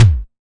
snappy kick drum one shot F sharp key

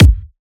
rich high end bass drum sound g sharp key

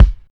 smooth kick drum sample f sharp key; kick drum samples

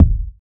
solid kick c sharp key, Loudest frequency: 113Hz; kick drum samples

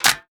urban clap single hit b key

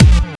rich top end kick drum a sharp key

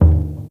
classic tom sample d sharp key; tom drum; tom drum samples

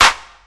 rap steel snare drum sample c key

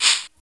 smooth shaker sound F# key; percussion

